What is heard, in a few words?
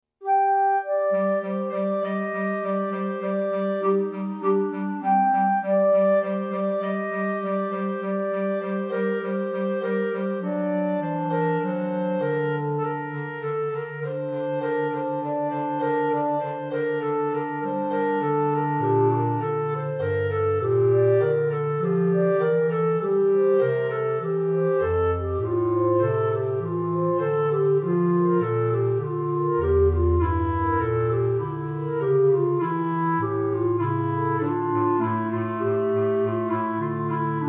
bourree